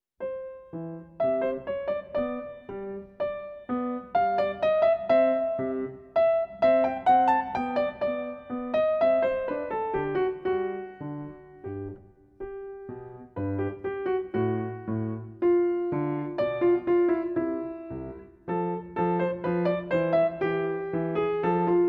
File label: Menuetto in C Major; Wolfgang Amadeus Mozart; Celebrate Theory recordings